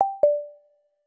MannerCancel; LG L18VC Classic US Celular

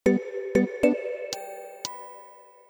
Bell on off; Samsung SCH-R561 Vice

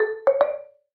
Celesta, notifications; Honor Pad X7

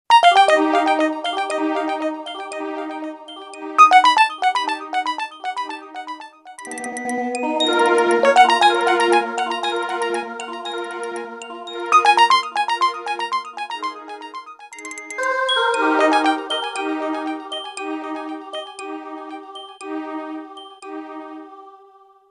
ringtones; Coolpad

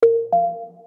notification; Elephone; U-Pro

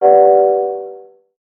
Notification

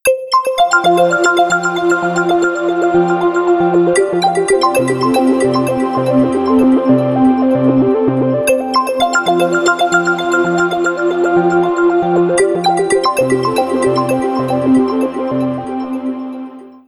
Psychedelic, ringtones; Honor; Power

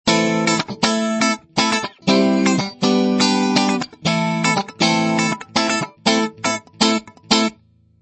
Power On-Off; BQ BQM-1404-Beijing; BQ Russia

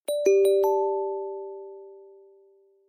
Swiftly, notifications

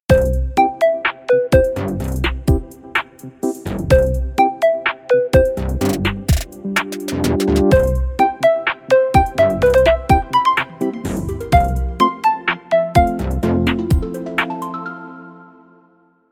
Elegant Trap, ringtones; Infinix Note Edge

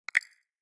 Froggy; Yota Yotaphone 2; notifications

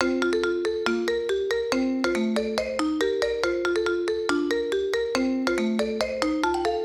Fanfare, ringtones; INOI 2 Lite 2019

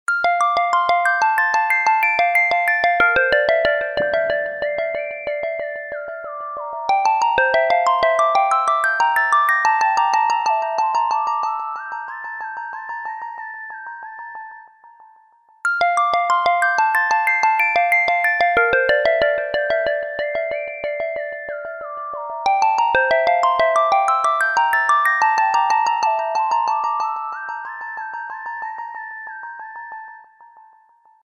Sweet Dream Harp; Coolpad

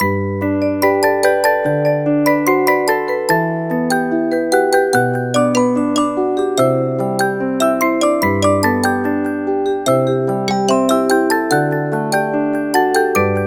Morning up; Philips; Xenium-E518

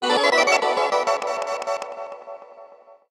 stuttered; Sony Xperia T2 Ultra; notifications

